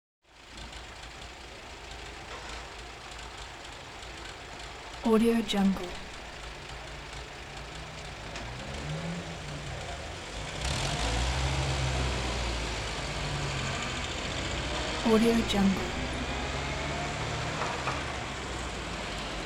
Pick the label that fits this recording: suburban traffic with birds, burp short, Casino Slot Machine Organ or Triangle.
suburban traffic with birds